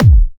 Electro Kicks

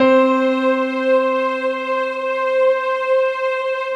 soft piano strings, Slow Strings; Optical Media International; Sonic Images Library

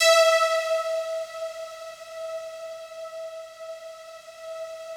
FOGATK1 E4, Yamaha VP-1; Music Store; 90's Sample CDs; FOGATTACK 1

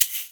SHAKER, FUNK; InVision Interactive; Percussion Slam

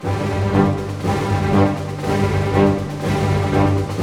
120 snare, army; East West; Scoring Tools; ORC

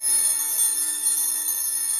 CHAIN CYMBAL; CYMBALS

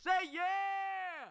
ride, Millenium MPS-400

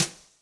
Brsh, Electric Snare; Yamaha DTXpress; drum machine

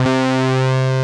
SYN, Analog; Sound & Vision; Gigapack I CD 2

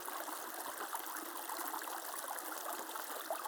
Waterway G Light

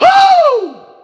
EDM HOO, vocal

